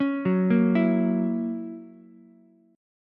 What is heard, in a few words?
Gb 7th Gb down Ukulele, chord; arpeggiated; JGuitar